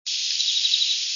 junco; birdsongs

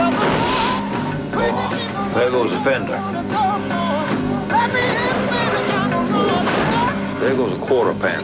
fender

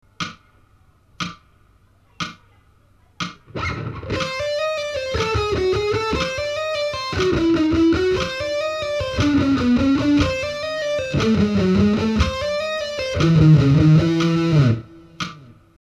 string skipping slow

